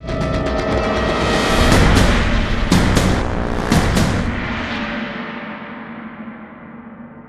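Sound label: sting, swell hit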